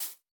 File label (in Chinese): 竹笋击打声